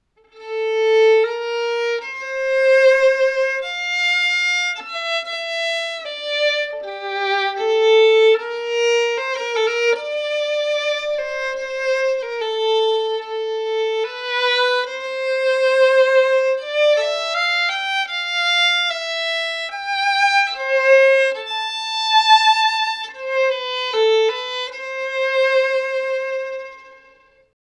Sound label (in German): Melodie; Violins; Sebastiano Goio; Geigenbau